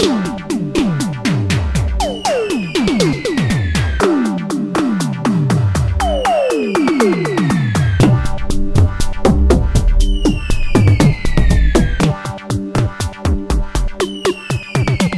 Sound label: edm toms